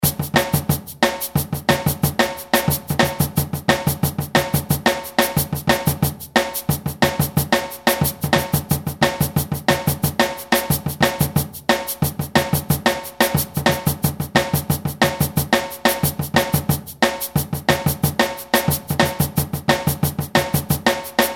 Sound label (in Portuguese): samba reggae caisse claire tamborim sourdo shaker